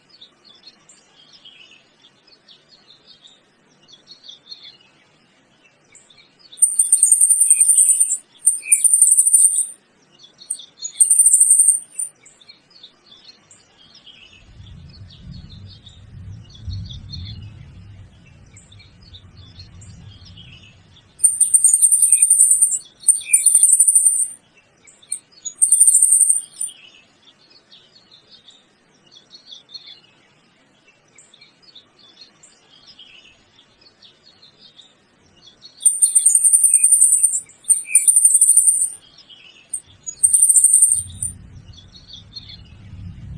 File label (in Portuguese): saira de bando